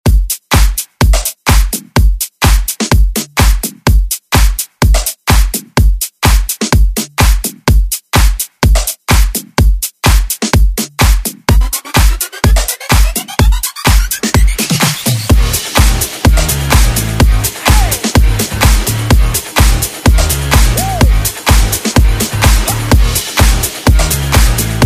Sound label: Dance